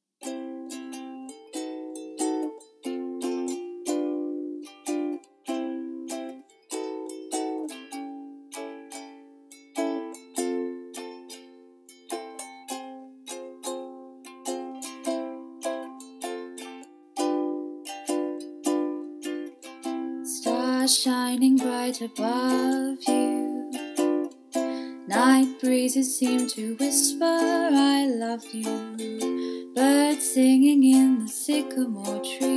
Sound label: F, Ukulele Cover